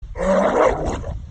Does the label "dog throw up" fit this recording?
Yes